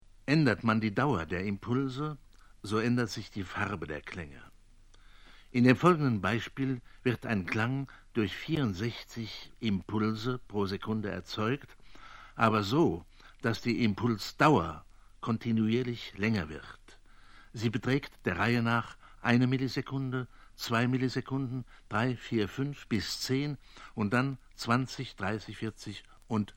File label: impulse; eimert